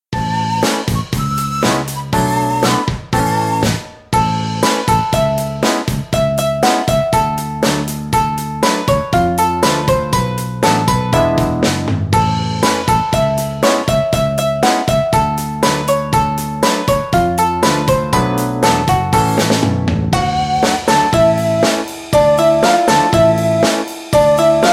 Rock; Shion Wahoo

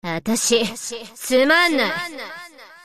Damaged; Anti-Air Cruiser Princess; Broken Form

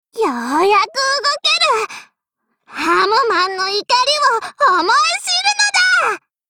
battle warcry

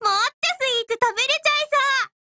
levelup; Choco; 巧可(云图计划)

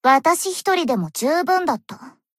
Battle Victory; Iori

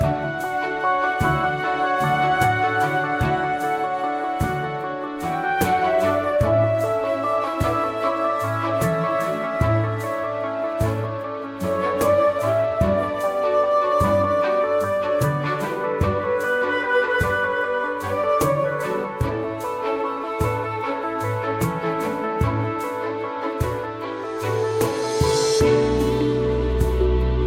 path loop

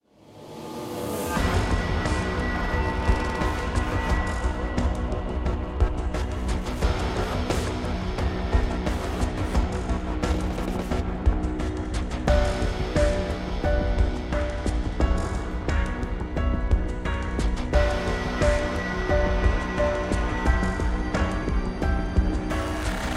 volcano intro

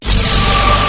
Blade loop; 假面骑士剑

